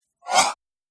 Whoosh Swipe

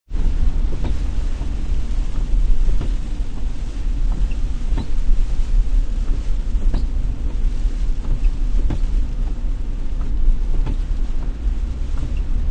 Windshield wipers from inside car.; Cars; Vehicles

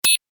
Tech beep, Beep, High Tech; Tech Sound Effects; Tech (Sound Effects:Science Fiction); Stuart Duffield

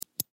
Keychain Mechanical Retractable Retract; Keychain Mechanical Retractable Click; Machinery; Blastwave; Foley

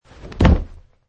Landing; Man wearing jeans and hiking shoes jumping and landing on carpet surface - variation 26; Michael Huang

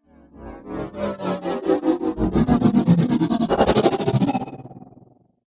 Whoosh Zone; Whoosh Sound Effects

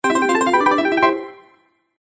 Game Sparkle Sounds; Video Game Sound Effects

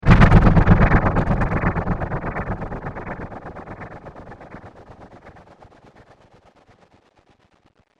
Heavy Rippled Impact; Miscellaneous Impact Sound Effects

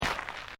FOOTSTEPS SNEAKERS GRAVEL FINE STEP; Footstep Foley; Footsteps (Sound Effects:Human)

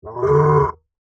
Monster Groan, Horror, Monster, Groan, Creature; Monsters

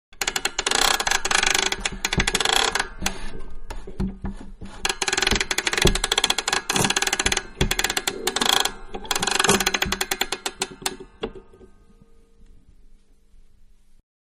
Music Box Wind; Toy Melodies; Toys; Manhattan Production Music

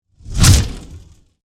Fireball Throw, Fire, Super, Hero; Flame Sound Effects; BlastWave

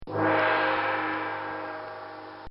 Chinese Gong; Brass; Musical

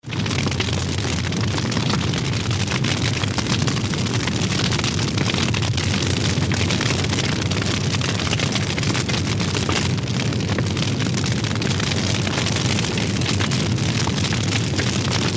Large Wood Fire; Big fire roar and crackle - burning building; Furnaces; Tom Hutchings

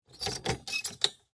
Animal Cage Open; Beetles; Animals; Insects; BlastWave

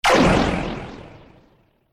Laser Cannon Futuristic High-Tech Blast; Scifi Science Fiction Alien Gun; Lasers

